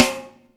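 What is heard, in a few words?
snare, Drums & Perc; AKAI S6000 CD-ROM - Ultimate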